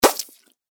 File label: wet impact